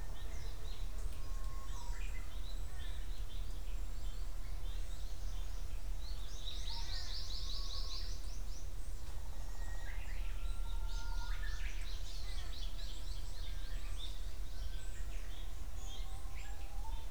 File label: Diurnal forest Okinawa